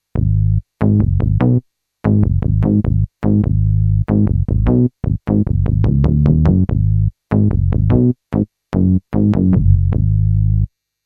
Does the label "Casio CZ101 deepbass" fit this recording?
Yes